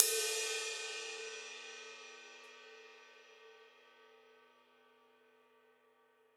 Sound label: ride, gretsch kit